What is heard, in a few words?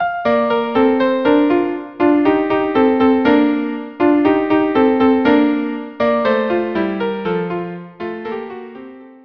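b flat allegro viola duo; mozart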